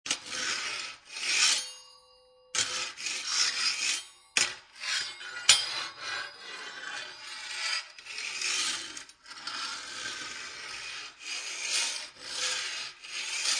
Rake Scrapping On Concrete, Foley, Rake, Metal Rake, Scrapping On Concrete, Many Scrapes